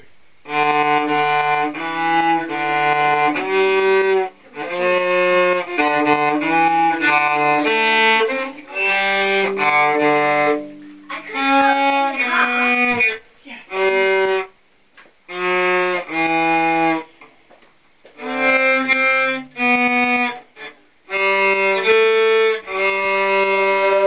cello

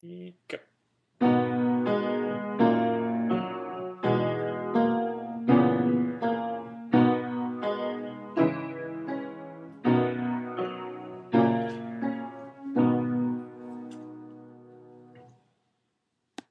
piano

